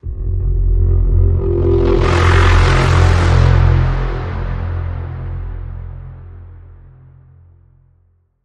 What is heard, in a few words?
Bend, F